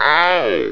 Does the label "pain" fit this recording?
Yes